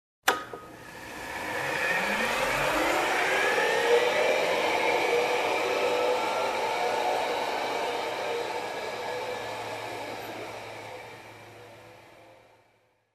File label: Electric mixer turned on